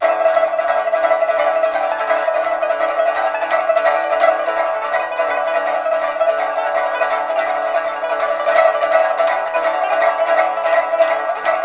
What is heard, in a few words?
PIPA HORSE; INIS - Opium; PIPA MAGIC